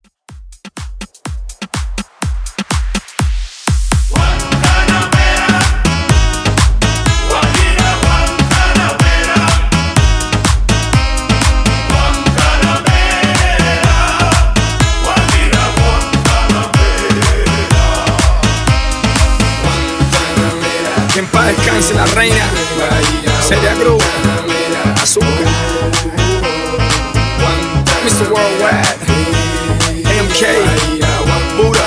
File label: Party Dance Mixshow 124 BPM